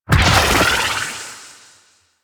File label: Aqua Board Speed Boost; Warframe; Yareli